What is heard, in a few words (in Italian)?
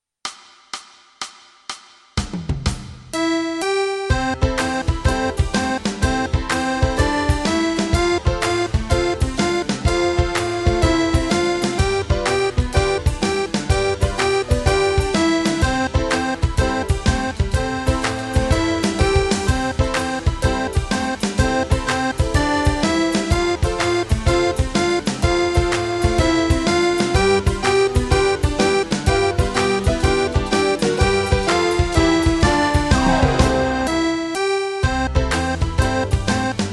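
Questa casa, Fisarmonica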